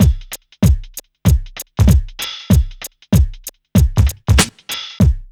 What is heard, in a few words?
RNB 96 BEAT, Hip Hop; USB Soundscan vol.34; Burning Grunge; AKAI